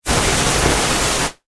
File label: Warfare Flame Thrower Burst; Miscellaneous Combat